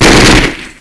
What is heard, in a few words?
fire; terrorist